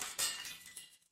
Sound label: glass shatter